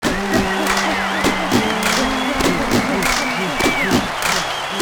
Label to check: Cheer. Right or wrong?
Right